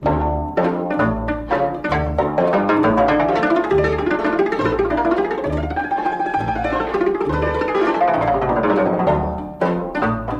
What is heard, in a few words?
doina drum break; tziganes